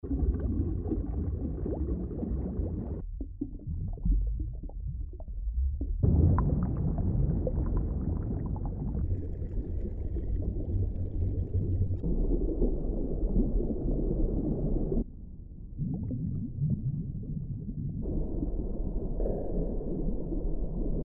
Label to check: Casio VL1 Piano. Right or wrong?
Wrong